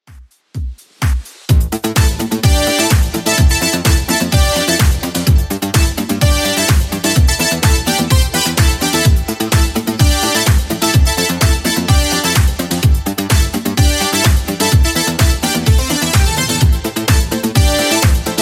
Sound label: 80s Redrum 127 bpm